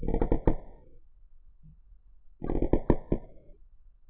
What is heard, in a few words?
squeak; wintertown